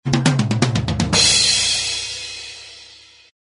drum kit rhythm

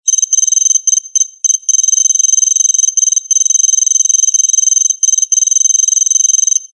comm telemetry data display low

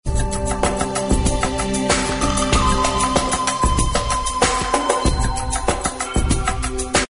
native wind

